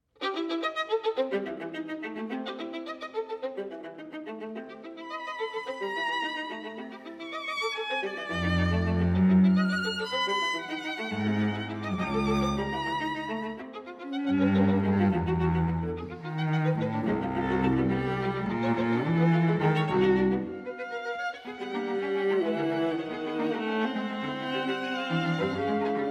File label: string quartet moderato